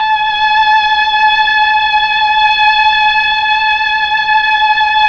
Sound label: STR, Melo. Strings, Slow Strings; Roland LCDP09 Keys of the 60s and 70s 1